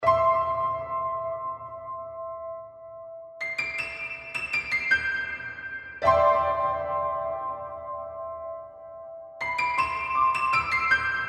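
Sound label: background music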